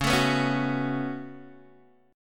Db 7th Suspended 4th Sharp 5th Db down Guitar DADGAD